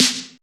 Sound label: Snare; Vengeance Samples